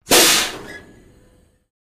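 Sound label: Air Release Quick Short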